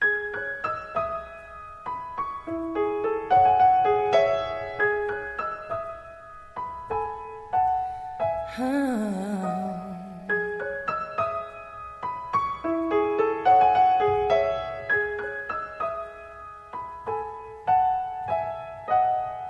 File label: piano, Loops Sad